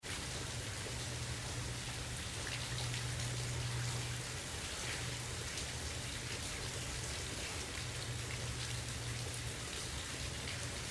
Medium Rain, Roof run off, rain gutter, distant city ambience; Seamlessly looping sound.; LYN105 package of City Ambience; Rain Sound Effects